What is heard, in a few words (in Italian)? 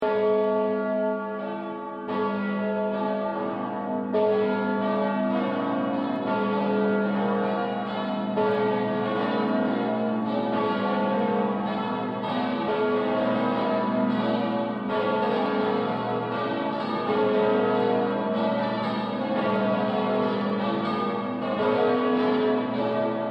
cattedrale